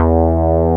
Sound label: SYNBASS; J.ROCK